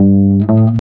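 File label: very fast ascending arpeggio, bass guitar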